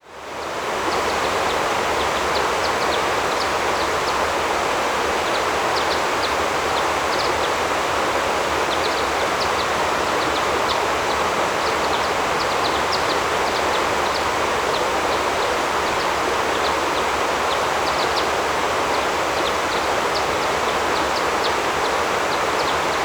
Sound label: nature river in forest